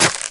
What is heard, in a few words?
Hit Stab; Friday the 13th: Killer Puzzle